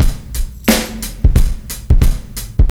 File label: Zapped 89 bpm, breaks; Zero-G Beneath the Planet of the Breaks